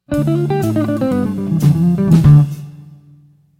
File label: Melodic Line